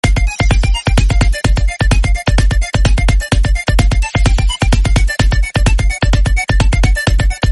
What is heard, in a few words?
ringtone